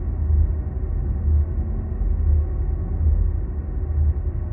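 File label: loop background noise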